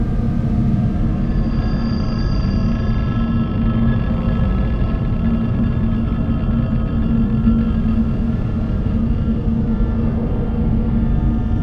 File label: juggernaut reptilian idle; Stellaris; federations